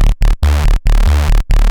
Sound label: Bass; Techno Music Maker